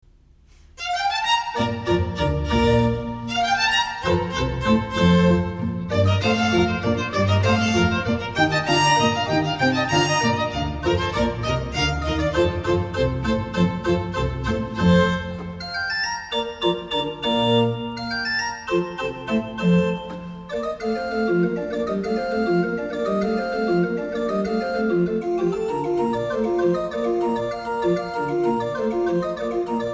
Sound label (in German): Truhen Positive; Digitalorgeln